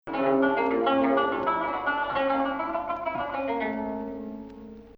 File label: Hijaz